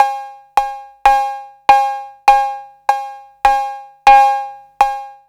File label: 808 tape cowbell